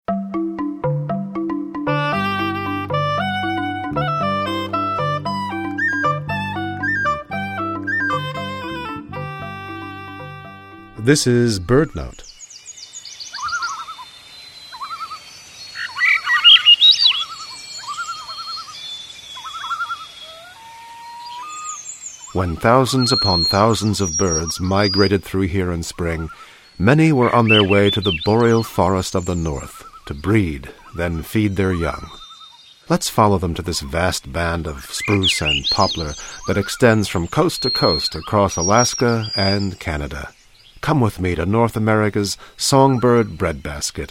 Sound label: Sounds of the Boreal Forest